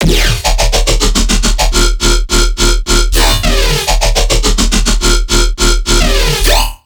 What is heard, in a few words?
Riddim Bass Loop - D# 140; Decepticon; Ghosthack; Riddim Essentials Volume 2